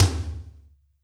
Roland R-8 Room Tom

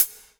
Yamaha TG100 Closed Hi Hat

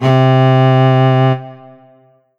Roland SC-88 Cello C3

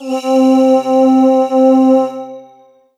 Roland PN-D10-01 Husky Voxes C3

